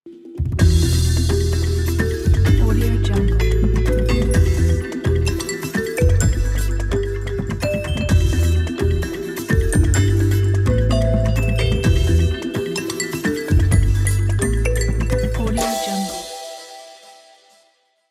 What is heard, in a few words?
african sunrise logo; musicdog